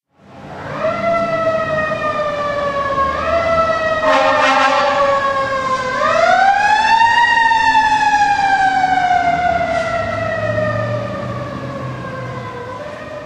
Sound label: fire engine pass-by